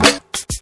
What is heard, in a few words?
rap clap single shot f sharp key